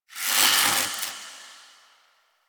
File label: Wisp Buff Electricity; Warframe